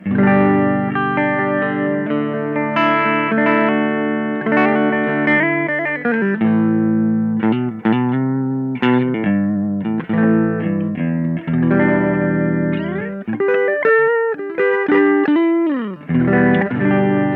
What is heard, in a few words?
clean